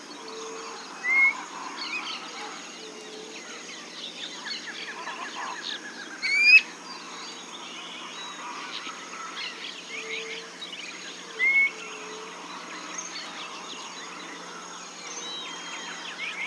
bird

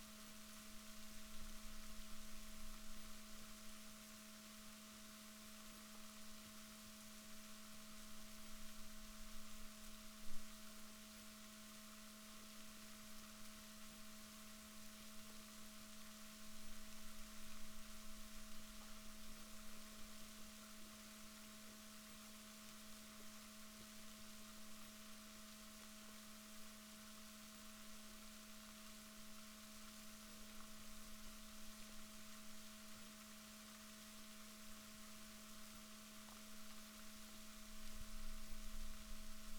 rorquals positif, Balaenoptera Physalus Chile

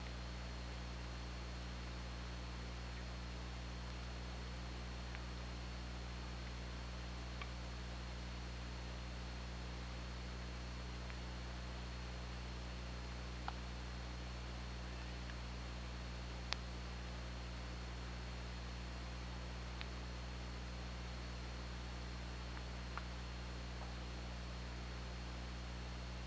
OrcaLab

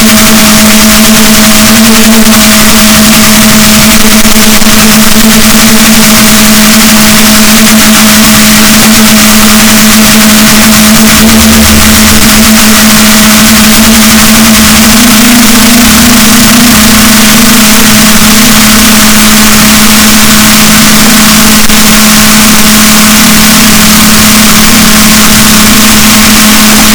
rorquals, Balaenoptera Physalus, boat

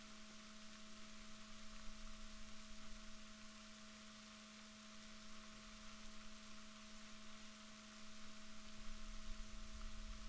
rorquals positif, Balaenoptera Physalus Chile

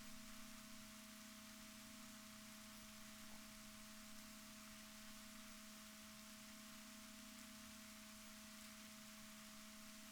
rorquals negatif, Balaenoptera Physalus Chile

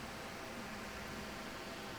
pulses, BALAENOPTERA; MONACO 2022-07

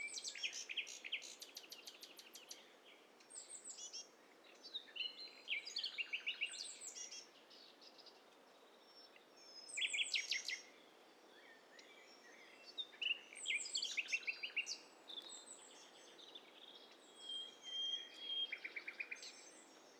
STEREO BIRD COCKTAIL PARTY

